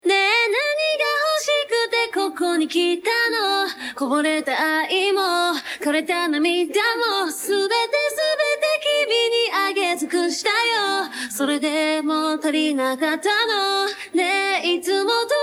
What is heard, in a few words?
Song Vo